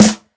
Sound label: smooth acoustic snare a key, acoustic snare sample tuned to the A note; snare-drum-samples